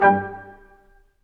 BRS Q, Quintet stac, Symphony Orchestra, Staccatos; Roland LCDP08